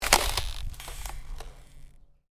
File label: Ice: short crack of ice under pressure